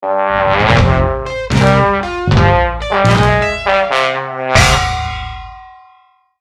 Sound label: gtr A